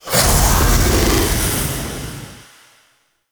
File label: Ground Slam; Warframe; The New War; Archon; Nira; Abilities